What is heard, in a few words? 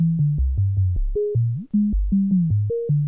hf bass; recreational research foundation